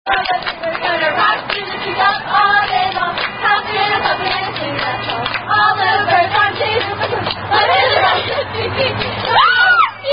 Ring Ching, Children's Clapping Rhyme; Ring Ching China

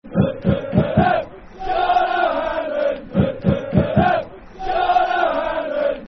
Football Chant (Soccer Song); Sean O'hanlon; Milton Keynes Dons Mkdfc

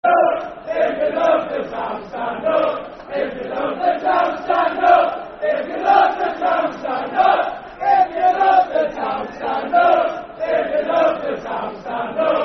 Football Chant (Soccer Song); Stand Up; Northampton Town Ntfc

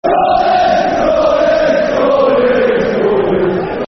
Geordies, Football Chant (Soccer Song); Newcastle United Nufc

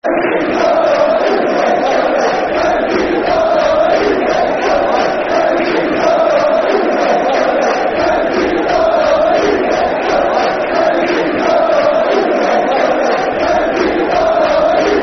Barmy Army, Football Chant (Soccer Song); Kevin Keegan's Barmy Army; Newcastle United Nufc

